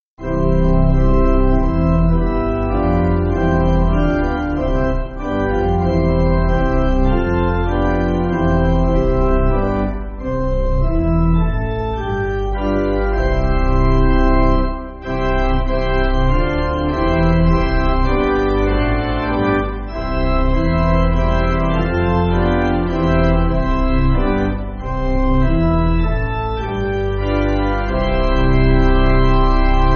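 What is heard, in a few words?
Light Of The World, pipe organ; Hull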